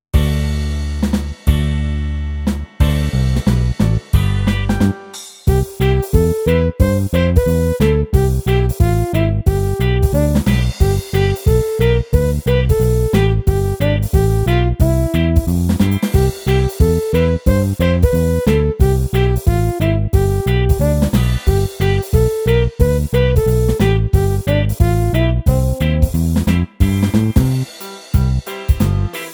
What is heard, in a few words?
Karaoke